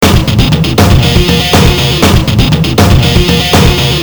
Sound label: depressing rock music